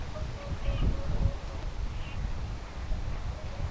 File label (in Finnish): tavin aania